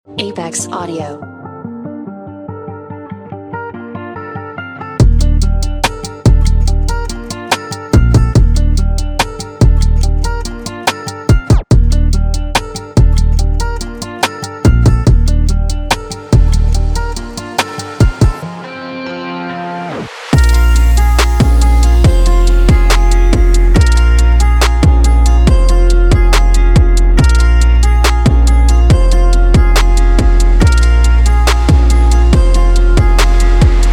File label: Guitar Trap; Apex Audio